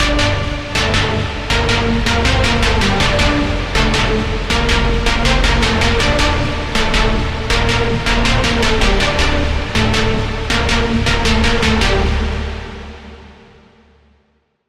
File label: Pluck, Retro Machine; Routed Sound; Vital Expansion - Reality Maker Vol.1